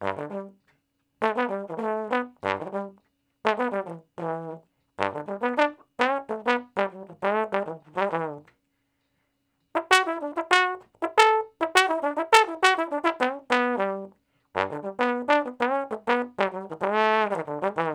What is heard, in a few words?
Trombone Straight (E), Horncraft for R&B Solos; Sonic Foundry (Sony Creative Software)